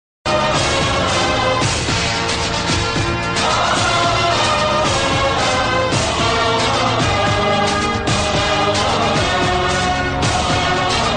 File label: Background Music Bgm Music Telugu Ringtone; Chennakesava Reddy